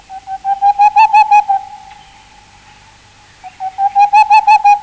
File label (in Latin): Aegolius funereus